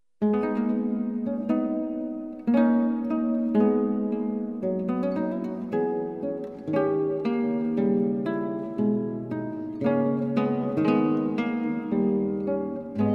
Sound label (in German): Larghetto aus Sonate C Dur fuer Blockfloete und Gitarre; Georg Friedrich Haendel